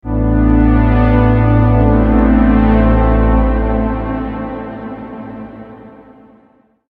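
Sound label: trance 140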